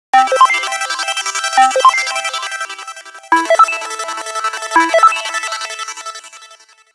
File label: Alien, ringtones; Samsung; Galaxy A SHW-M100S